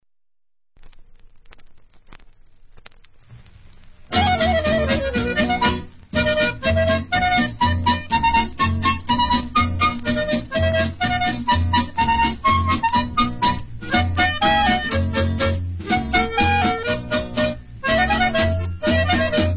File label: Heel And Toe Polka, Instrumental